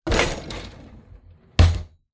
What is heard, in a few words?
Drawer Kitchen Utensils Drawer Close